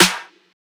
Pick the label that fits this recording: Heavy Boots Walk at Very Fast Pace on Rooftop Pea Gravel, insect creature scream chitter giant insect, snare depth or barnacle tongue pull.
snare depth